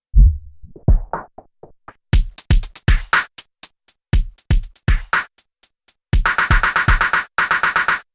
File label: Dubstep; KOPRO+